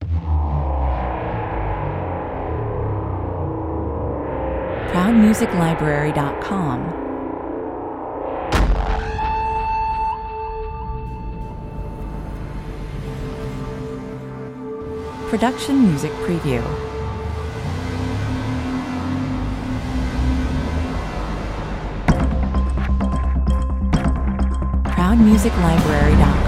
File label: Driving action trailer with numerous bomastic hits and risers: fast, hard, direct.; Perfect for movie and gametrailers in Hollywood style, thriller